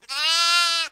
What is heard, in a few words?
bah